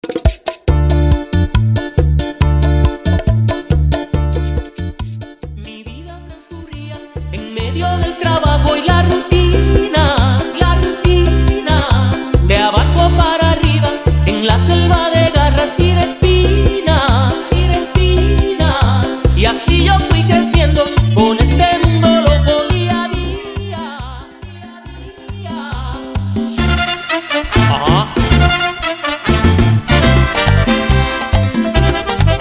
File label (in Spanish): MERENGUE, bachata to; DJ Gaibor; transition edit; 139 bpm